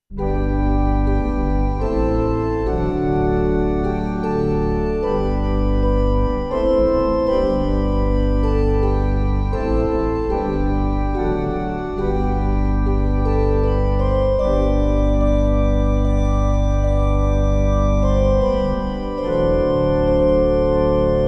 National Anthem

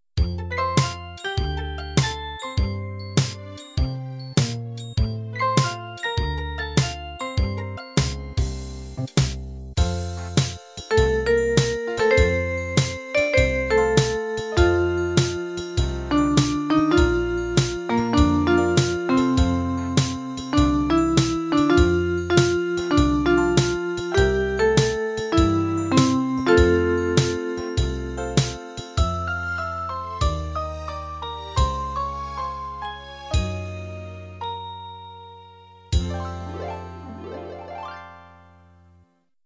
slowdown end

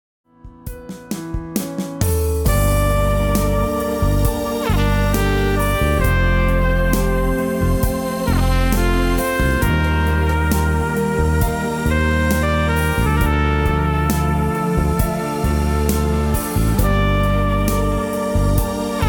Trumpet Fall

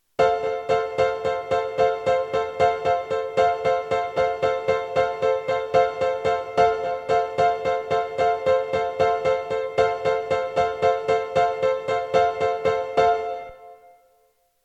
World Repeating Piano

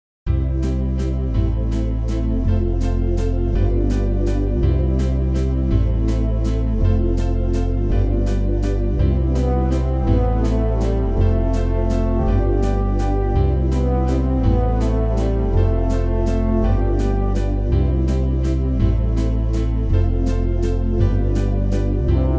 Summer Pad

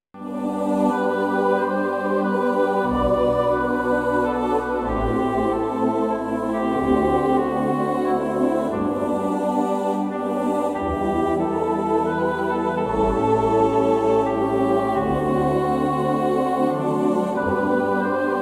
Left Hand Chord

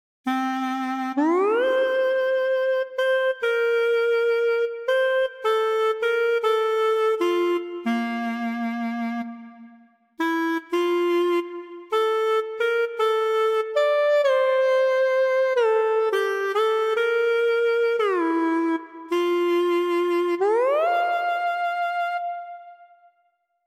Portamento

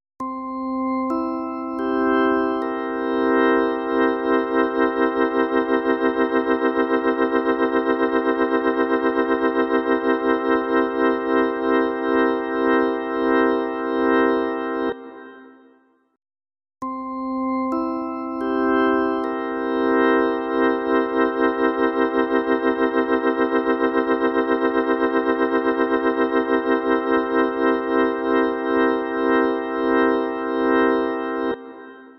rotary speaker

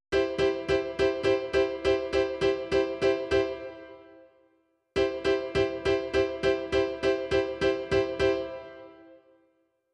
Repeating Piano

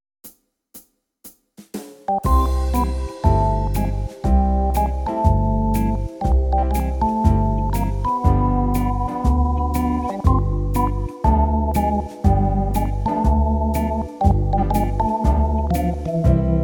Organ Tremolo

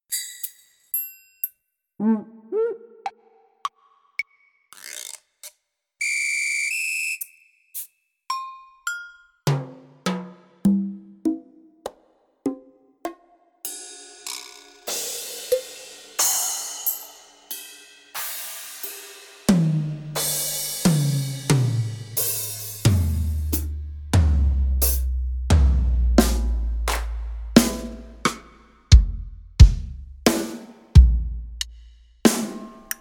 Drum

